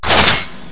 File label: alauncher select, weapons; XDM